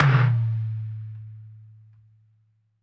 LOFI, LO-FI KITS

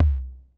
kick, Roland MC-909

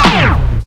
backscratch, scratches; Zero-G; Freak Beats

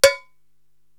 Cowbell, Korg TR-Rack

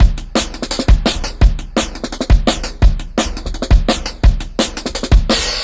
Brown 8 Bar, Drum n Bass Beats; KJ Sawka; Drum n Bass & Beyond

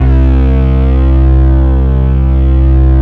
BASS, Drum & Bass 150 BPM; Ueberschall; Drum & Bass Flash Trackz

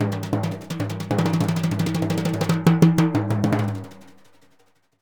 Synclavier Percussion, World & Orchestral

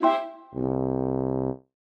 orch sting; musicradar; cartoon caper

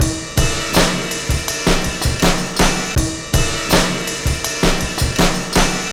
Finished, breaks; Jungle; Jungle Warfare 1&2

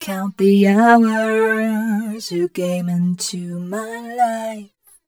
f mel c, fem Vocals

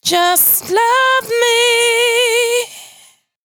92bpm Bb, Velvet Vocal; Zero-G

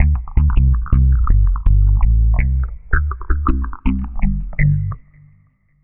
Dub Bass, Electric Bass

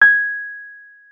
WURLITZER, G#6; Xsample VOL-1; 90 s Sample CDs; WURLITZER RS

